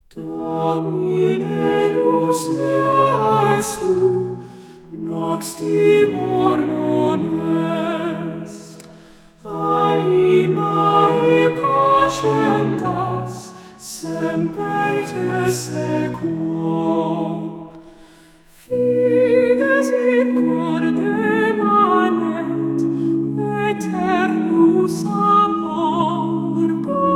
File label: Lord is my light Female, gregorian chants